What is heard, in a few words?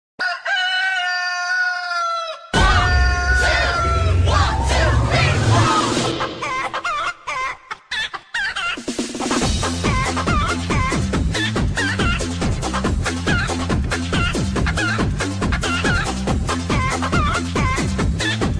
funny chicken